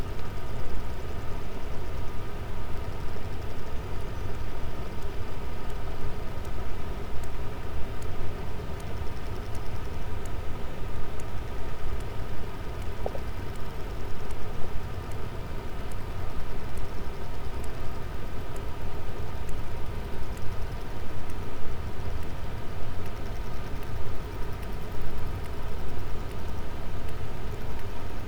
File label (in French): cachalot positif, Physeter Macrocephalus